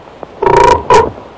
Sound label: frog